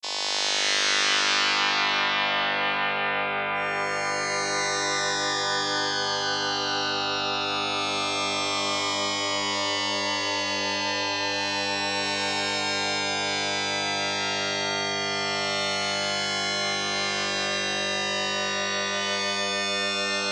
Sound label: more Sines